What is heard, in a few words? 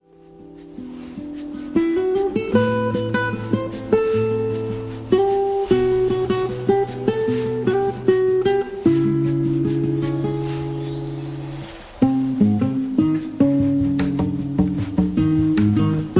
Bossa